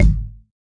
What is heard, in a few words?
round kick; Hip Hop Specialty Kit; STYLE KITS (Hip Hop, Etc)